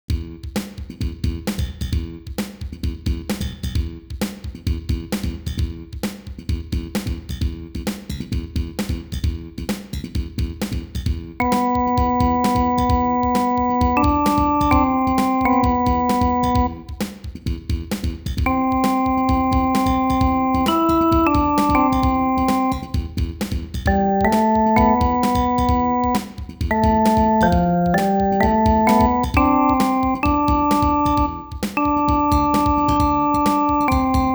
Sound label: concerto